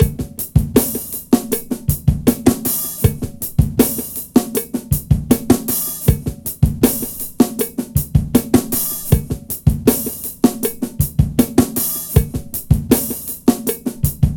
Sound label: COW FUNK 79 bpm; Planet of the Breaks